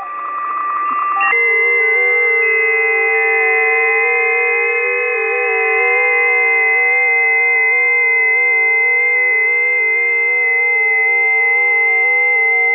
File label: 20m busy; ft8